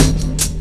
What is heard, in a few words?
HIT, Hits & Brass